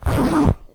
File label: short trouser pants zip closing